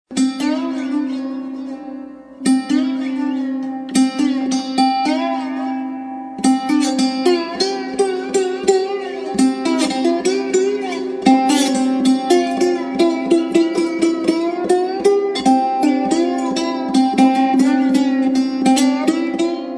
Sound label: celestial harp